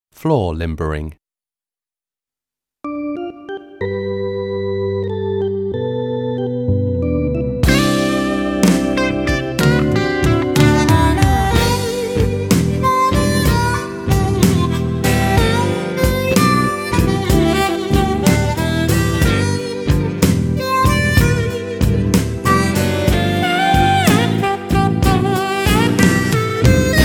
Floor Limbering Modern Grade 5

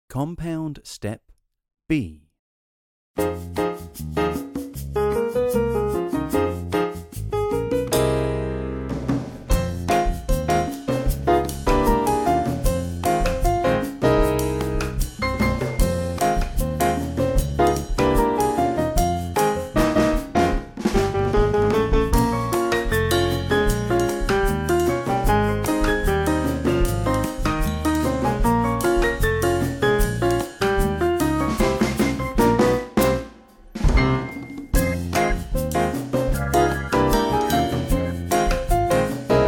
Jazz 4 4

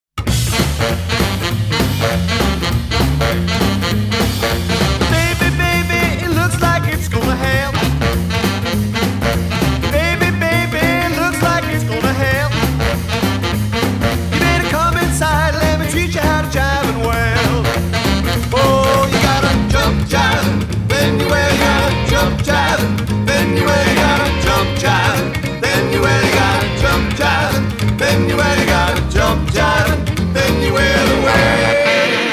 4th Rock Roll

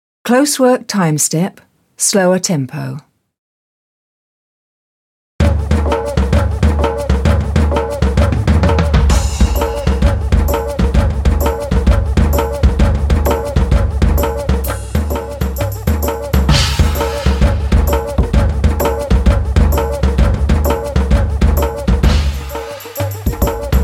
Closework Timestep slower tempo, tap

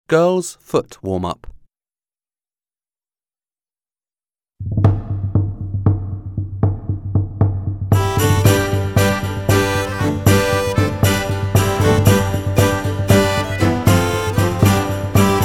Foot Warm Up Modern Grade 5

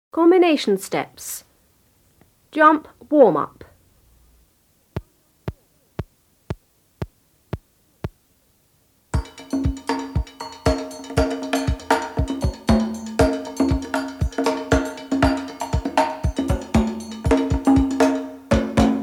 Int Jump Warm Up, jazz; jazz intermediate foundation

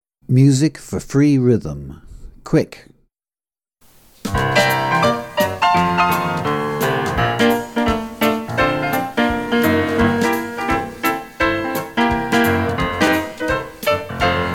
Free rhythms Quick tempo, tap; tap advanced 1

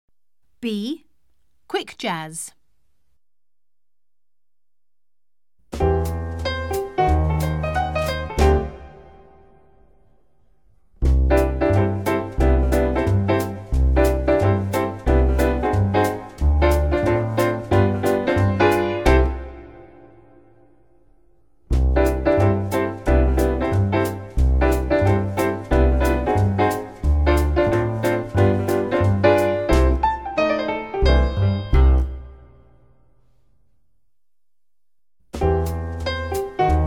Quick Jazz, tap; tap grade 5

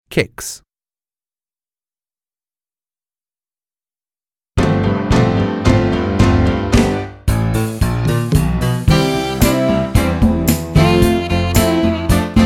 Kicks, modern; grade 4 modern

